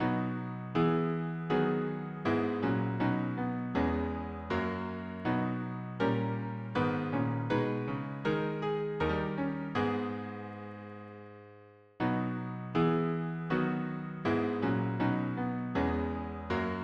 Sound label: harmony piano